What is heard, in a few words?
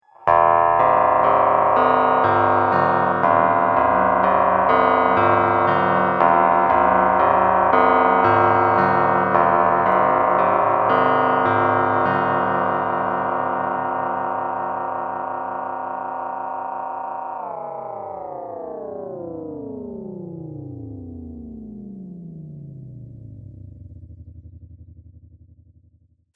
osc x guitar, ring modulator; analog synth